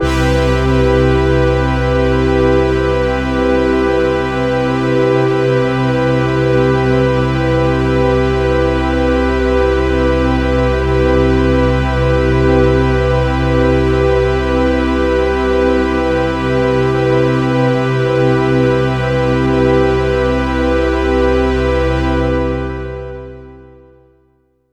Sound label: BRASSY MAJ, Synths